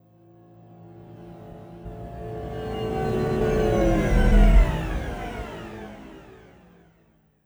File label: long slow slide